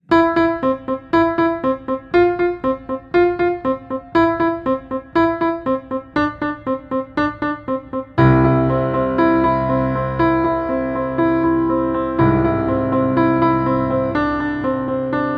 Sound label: Evolving Piano